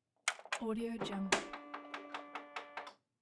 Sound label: printer turning on